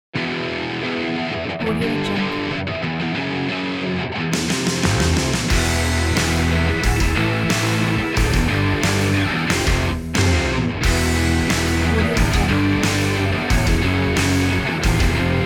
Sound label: hard and heavy